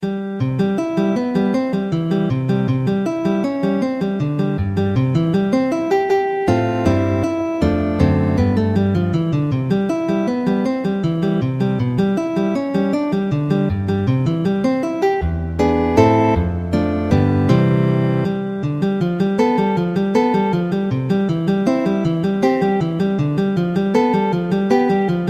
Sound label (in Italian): valses, guitar